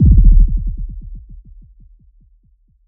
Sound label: FX